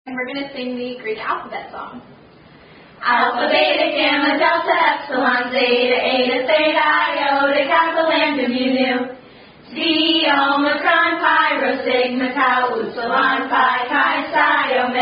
Greek Alphabet Song; Delta Gamma Version

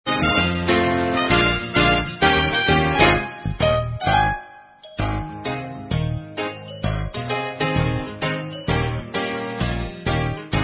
Karaoke Songs; Girl Scouts

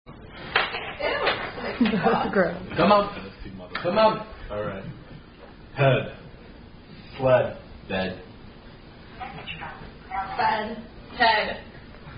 Drinking Game Rhyme

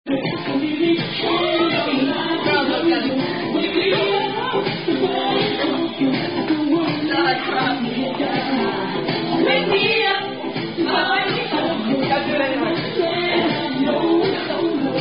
Drinking Game, Music Keyword Drinking Game

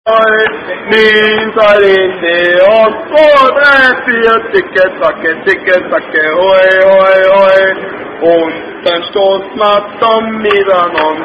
German Drinking Song; Ziggy Zaggy Oy Oy Oy

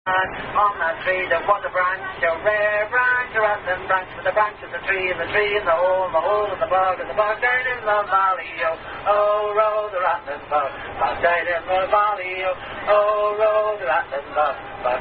Irish Folk Song Sing Along; Rattling Bog, Aka There's A Hole In The Bottom Of The Sea

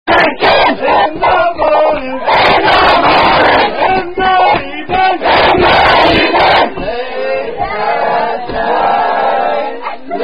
Rugby Golf Crawl, Call And Response Song; X Rugby Golf Crawl

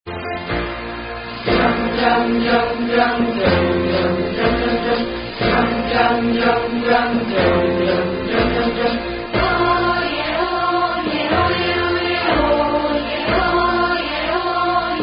Orchestra; Girl Scouts

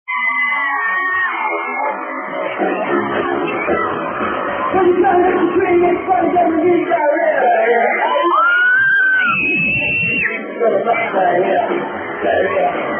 Diarrhea Song

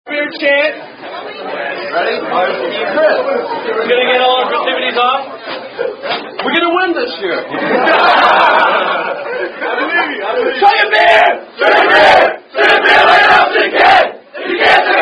Chug A Beer Chant; Alpha Sig Gamma Theta Sig Bust 08; Take A Drink Like A ---- Can Fraternity Chant

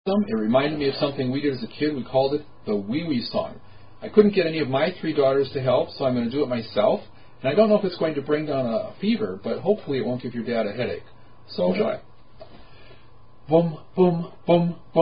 Wee Wee Song [Three Part Round (Variant)]

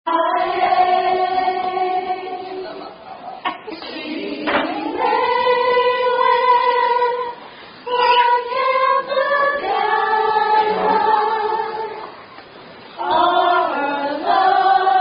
Delta Sigma Theta Chant Song; Dst Sweetheart Song

